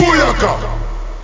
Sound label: grenade